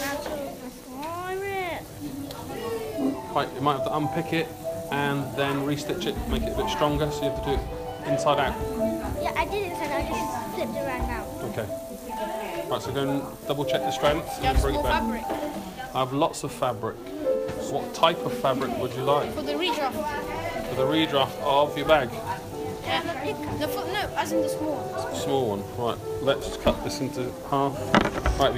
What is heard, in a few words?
Classroom music